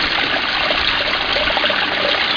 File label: spawn river; spawngirlsounds